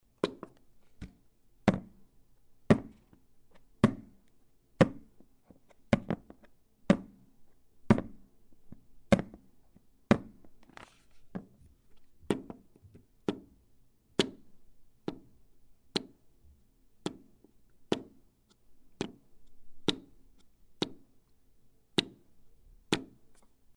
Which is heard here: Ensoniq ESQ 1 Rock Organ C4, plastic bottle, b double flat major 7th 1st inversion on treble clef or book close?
plastic bottle